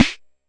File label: Jomox MBrane drum machine; Jomox MBrane 11